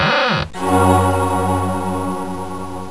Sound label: chest